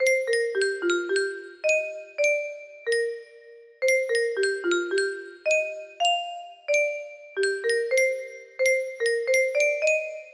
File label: leitmotif, music box melody